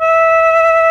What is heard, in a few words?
SHIAO E3